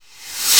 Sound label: NOISE reverse; AKAI S6000 CD-ROM - Volume 1